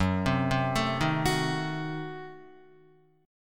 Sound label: Gb Diminished 7th, down, Guitar; arpeggiated; 4F,4A,5C,5G,5C,6E; JGuitar